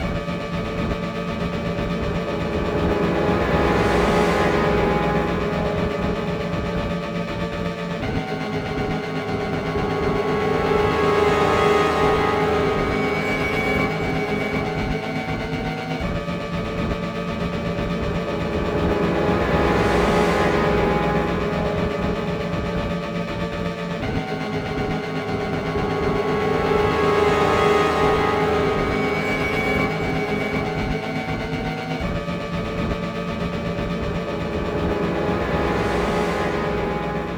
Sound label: Night Drone; dying light gamerip 2015